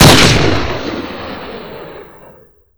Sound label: cheytac